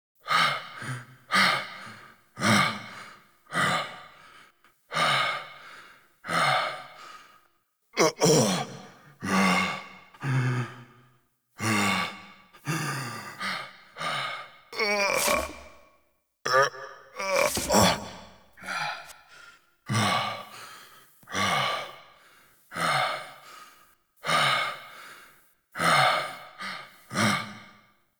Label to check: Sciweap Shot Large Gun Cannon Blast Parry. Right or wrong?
Wrong